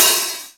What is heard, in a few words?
Hats Real Open